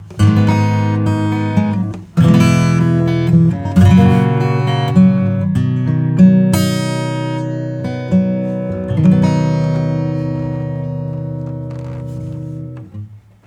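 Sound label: acoustic compressor; Titanium 3; strong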